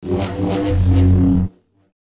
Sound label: Saber; FXHome